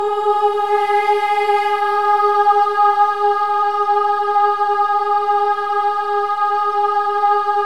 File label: OH EH; Spectrasonics